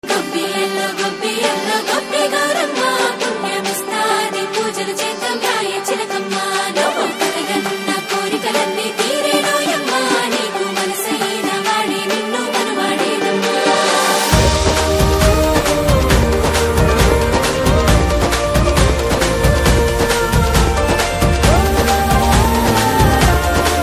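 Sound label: background music Ringtone; Ruler Sankranti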